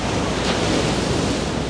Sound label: sea restore up